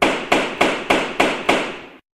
gavel; CosmicRage; CosmicRageSounds

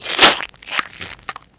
latex, trolley; CosmicRage

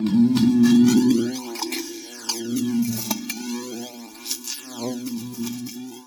bee

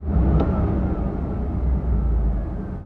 rev, cars

